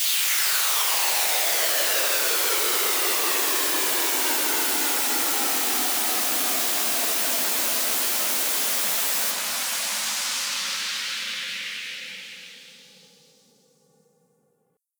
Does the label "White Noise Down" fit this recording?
Yes